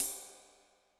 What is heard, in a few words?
Flat Top, Cymbal, Yamaha DTXpress-II